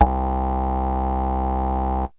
sh101, Basses

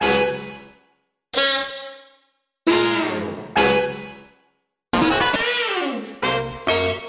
135 some crazy horn intro; HORN LOOPS

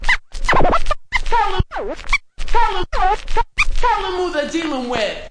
turntable tell em, scratching and turntablism; DRUM LOOPS and BREAKS

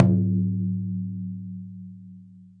okedo, Japanese Drums; ETHNIC and WORLD PERCUSSION

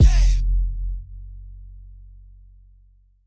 kick punch; Hip Hop Specialty Kit; STYLE KITS (Hip Hop, Etc)

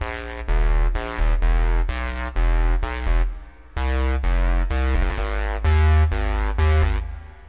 128 walking phasey bass

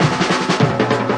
101 african snare roll; 101 to 110 bpm

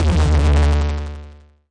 nasty stormfront, Electro FX

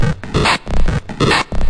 140 hexbeat, DRUM LOOPS and BREAKS

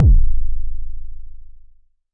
Jomox MBase, drum machine; Jomox MBase 01

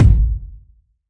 kiks

